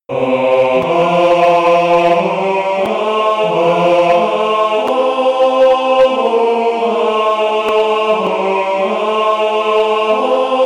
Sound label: bass; generationenchor